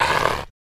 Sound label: Rottweiler Inhale